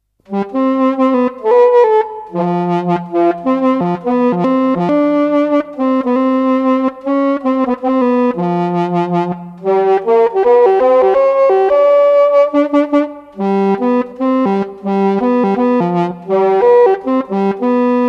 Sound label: Saxophone